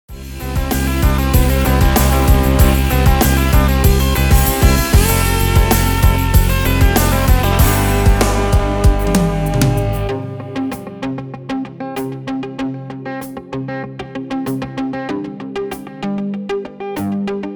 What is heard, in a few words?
Arp Rock